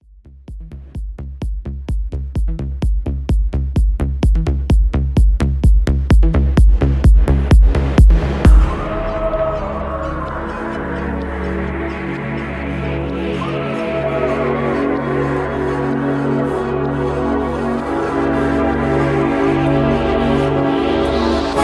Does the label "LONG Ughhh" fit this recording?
No